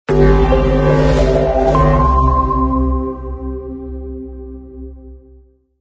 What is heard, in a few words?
Laser Writing Logo With lightsabre SFX